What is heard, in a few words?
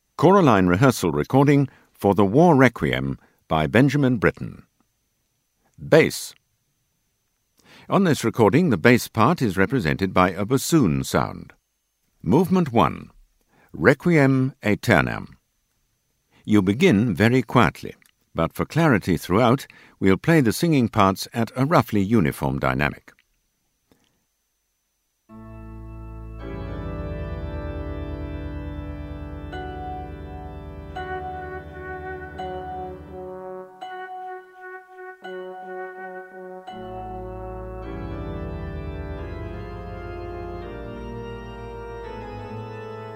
Bass; Britten War Req